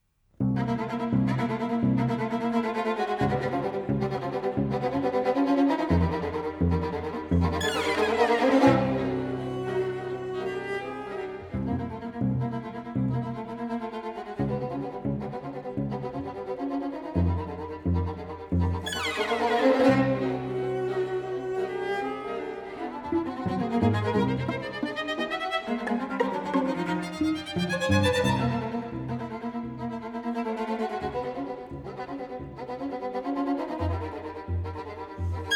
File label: String Quartet, Intermezzo, Prestissimo; String Quartet No. 4, Op. 28 - III. Intermezzo 2